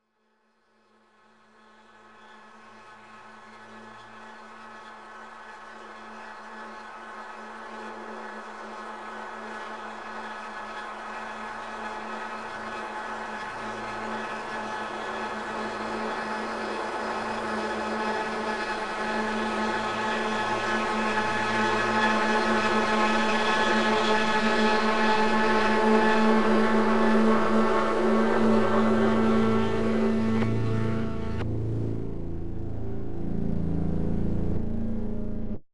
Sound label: bad memory, it creeps in!